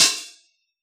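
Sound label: hi-hat T9 Low Open, Yamaha DJX; 155 DJX Kit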